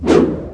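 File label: golem attack; bradium golem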